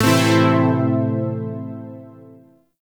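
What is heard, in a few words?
Shawzin Major Chord; Zariman Shawzin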